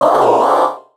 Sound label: Error